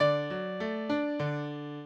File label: minuet, mozart